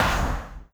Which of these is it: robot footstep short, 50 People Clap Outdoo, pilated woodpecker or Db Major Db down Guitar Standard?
robot footstep short